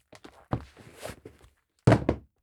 Takes Off Leather Shoes

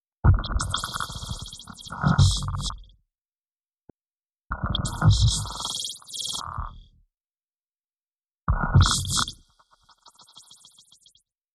Electro Fluctation High

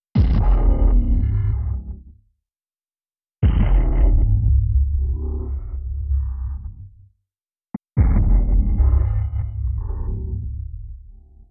Impact Low Periodic

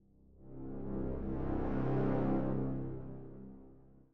Dramatic Brass

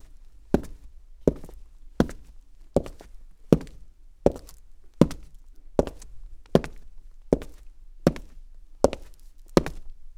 Cement Dress Boots, Footsteps

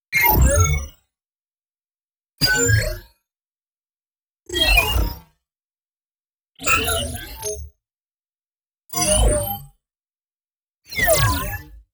Ultra High Splash, Electro

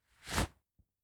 Pokes Into The Sleeve, Clothes

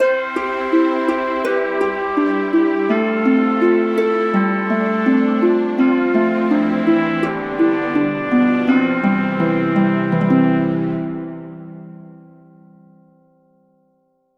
Music Stab, Christmas

